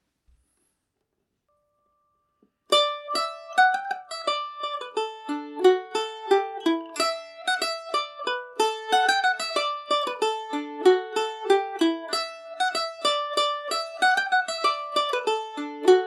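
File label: dennis murphys polka revisited D major, mandolin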